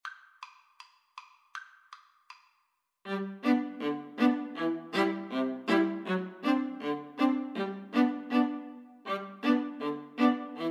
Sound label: Viola Duet